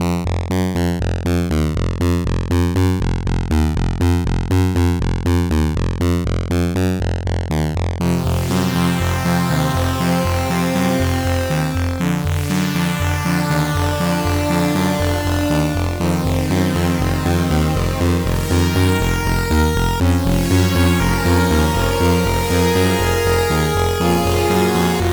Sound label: Retro Chip